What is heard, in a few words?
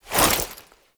Bayonet Melee Whoosh Gear Accent; Warframe; Tenno